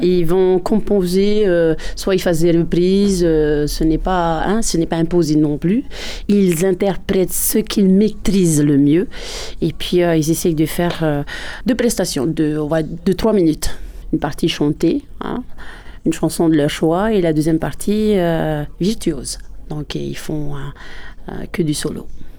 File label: Ukulele; concours; jeunes talents